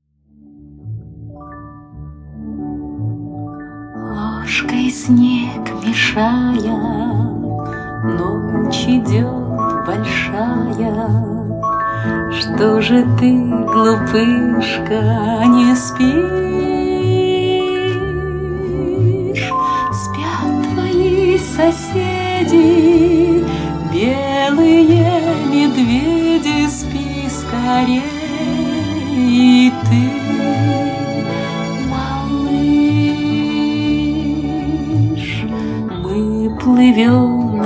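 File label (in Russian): Детские колыбельные, колыбельная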